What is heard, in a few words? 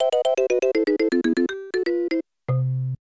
Unused Jingle; SNES Test Program